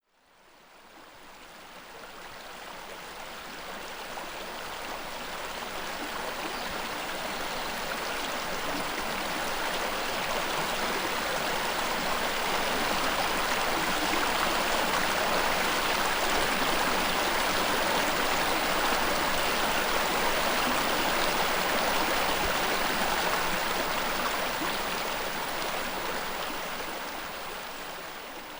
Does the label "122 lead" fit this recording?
No